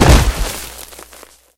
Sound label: concrete fall; Golden Crayon Sounds